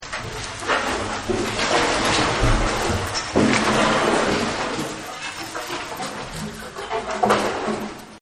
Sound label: Wood And Metal; Sound Effects:Materials